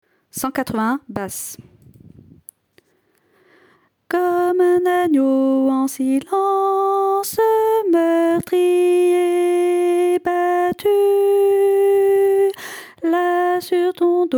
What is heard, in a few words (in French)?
basse